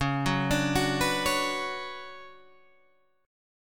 D Major 13th Db, chord

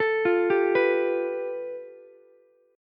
A 7th Suspended 2nd Sharp 5th, Ukulele; A7sus2#5 arpeggiated; JGuitar

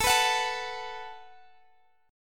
Gb Minor Double Flat 5th

